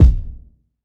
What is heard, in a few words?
low tone kick drum single shot b key